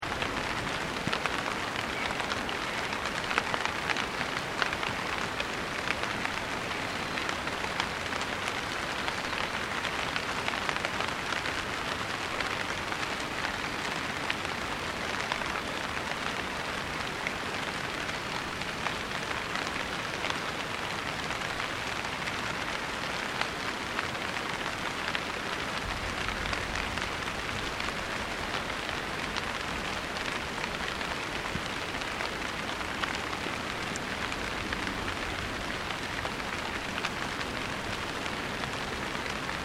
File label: Ambience Tazawako Rain Light Forest Birds; Recorded in Golden Week in Japan 2008; Forests And Jungle Sound Effects; Foundation1